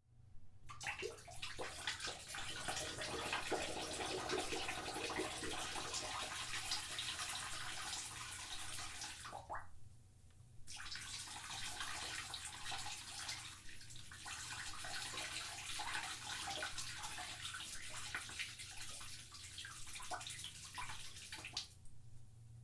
Pee In Toilet

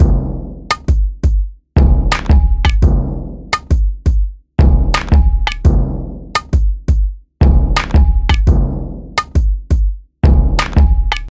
Grimey Hip Hop

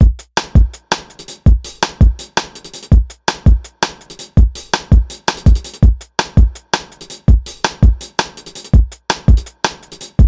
Jazzy Drum N Bass

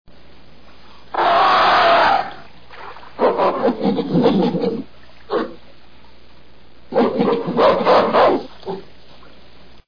Gorilla Ape

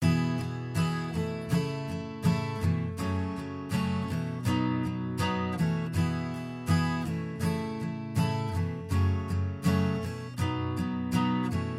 Guitar Acoustic

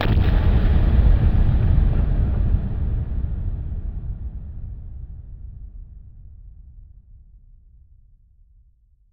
Nuclear Bomb

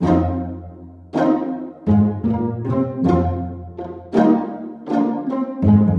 Orchestra Plucked

